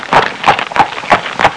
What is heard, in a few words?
Farm animals trotting sound